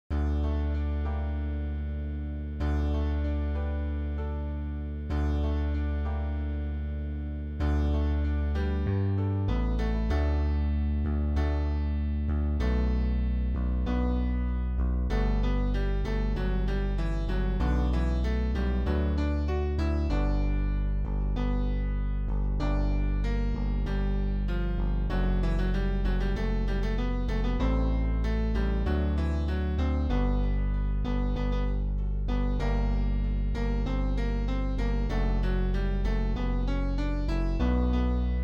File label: Just the Way Shout Chorus Bass